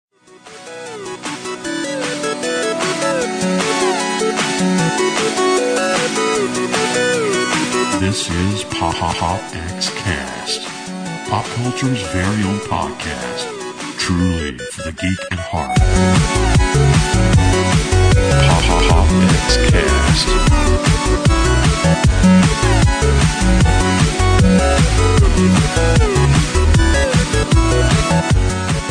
Ringtone

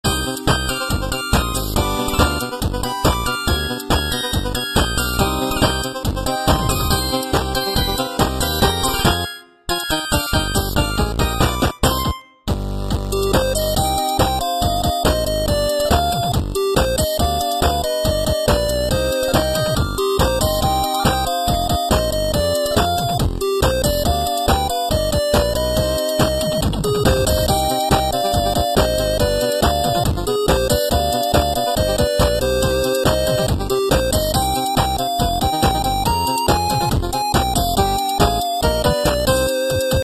8 bit

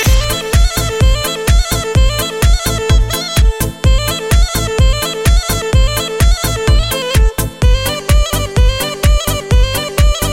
Balkan Folklore

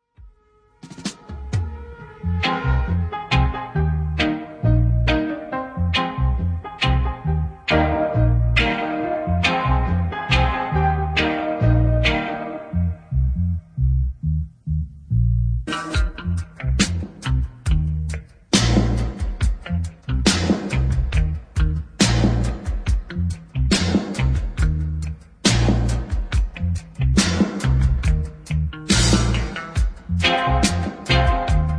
rain dub

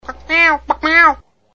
meow

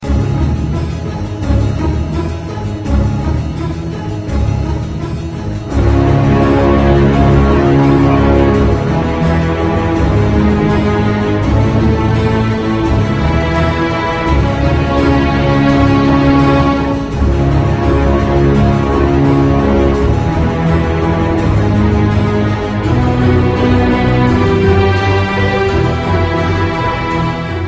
halloween music, ringtone

